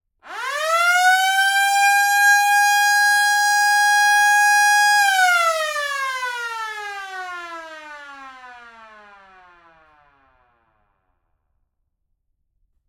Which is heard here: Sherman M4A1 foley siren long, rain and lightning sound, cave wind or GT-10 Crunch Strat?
Sherman M4A1 foley siren long